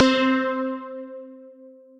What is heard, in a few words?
Juno Pulse, Synth Keys